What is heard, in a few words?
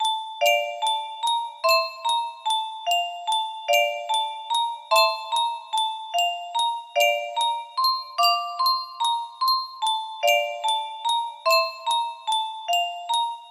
Intro, music box melody